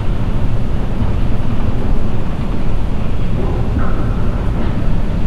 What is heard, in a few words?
industrial amb lite; Quake 4 Alpha; id software